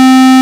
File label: square sync, raw sync pads; Zero-G Ambient 1